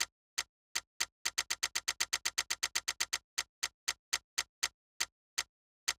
Turntable

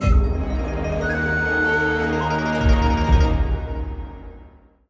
System Defeat

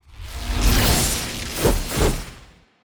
Mage Skill

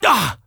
BOSS man hurt

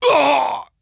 BOSS Man Death

